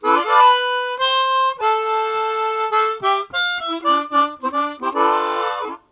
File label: Minor Octaves, Harmonica; Zero G